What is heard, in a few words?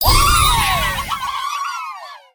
Achievement Completed; Custom UI Sounds; Slime Rancher